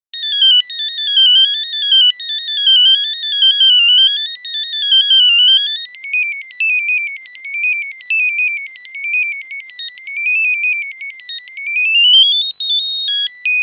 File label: Nokia Buzzer; Windows Phone apps